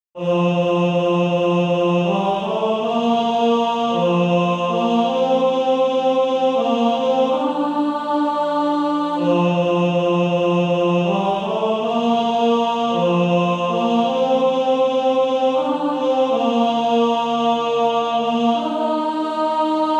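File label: Male Choir, tenor; Redeemed How I Love To Proclaim It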